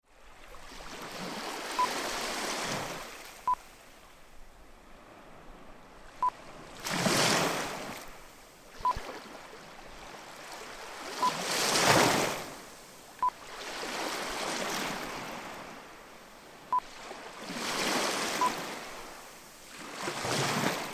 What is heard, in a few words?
Beach Close to Water; Gili Beach